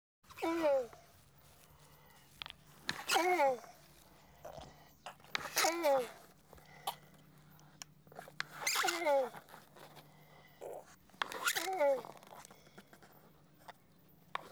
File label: Animals, Seychelles Tortoice; Animals, Seychelles Tortoice Mati; BBC Sound Effects Library